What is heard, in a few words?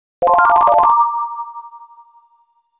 Coin